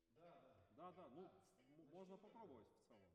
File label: Alto, RNR for piano